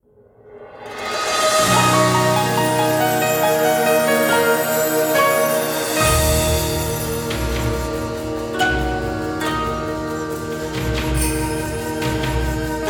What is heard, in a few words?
battle; Yotsume God Reunion; battle tagata A